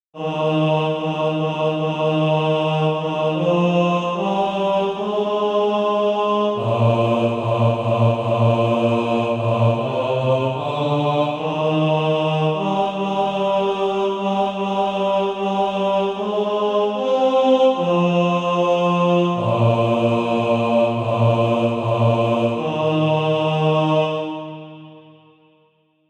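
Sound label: bass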